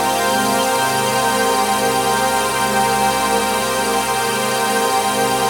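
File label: trance pad; Best Service; XX Large - Pads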